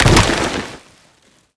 ice hit break; Nut Boarding

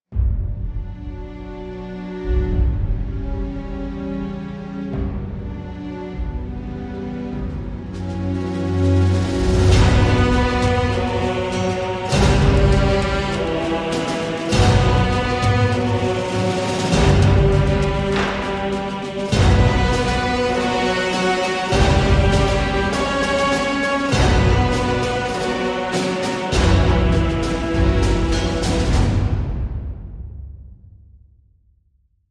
Pushing Forward (alternate)

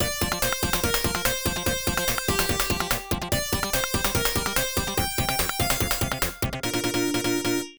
Unused Jingle 1 (Victory!!!); Hebereke no Popoon; arcade

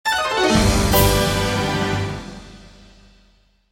Trial Failure; active life outdoor challenge

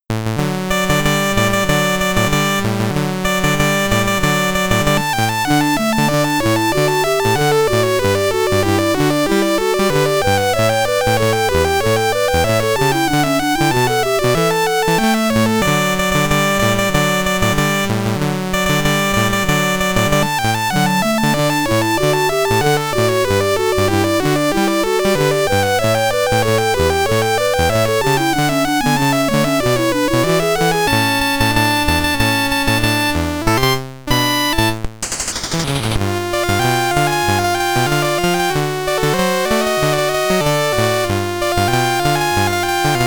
Loader (SID Stereo); Winter Olympiad 88; Commodore 64

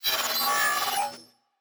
Data Calculating

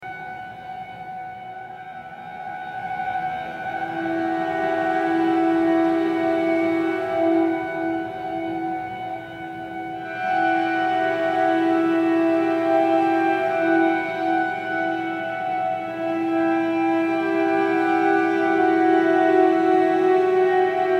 Airy Strings and Bass Strings Only; The Last of Us

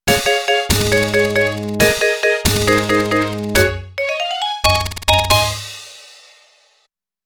Spaceship Part Successful Recovery!; Pikmin

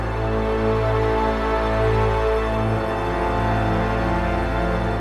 jingle next round

